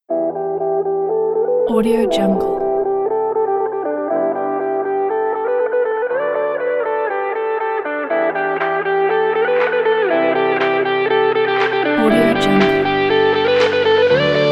upbeat corporate

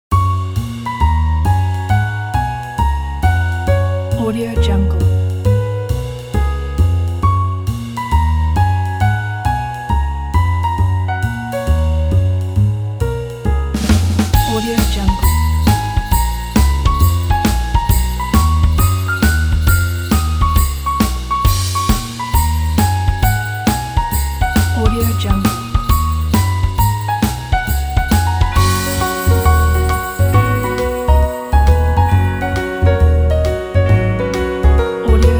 christmas ad

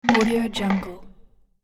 real item drop

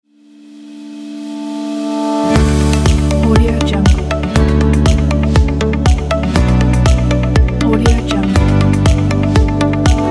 elevation inspire ident

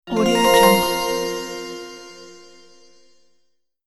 fairy magical reveal

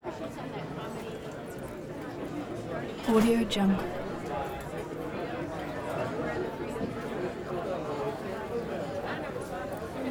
restaurant ambience busy; prosoundfx

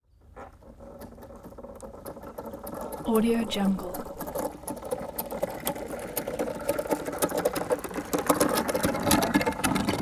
toy wood wagon short rolling

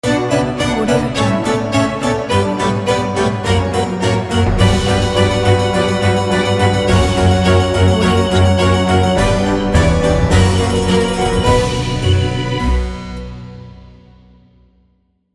classical orchestra ident

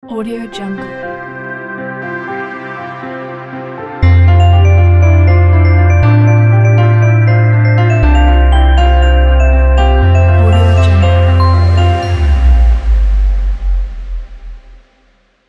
motivational logo; audiocook

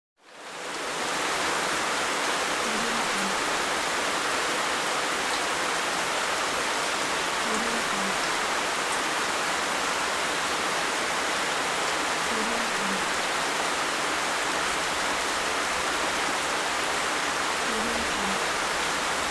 pouring rain

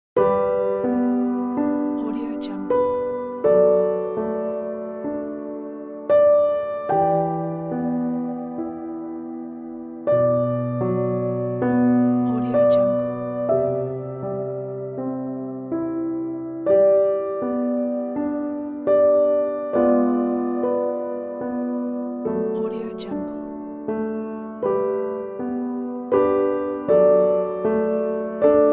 gentle piano; soundrose nonexclusive

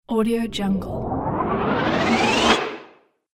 riser

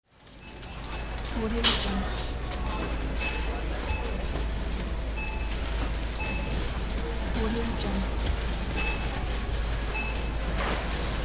grocery store checkout

